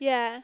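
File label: "yeah", conversational grunts in English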